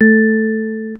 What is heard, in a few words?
notification sound for a, interface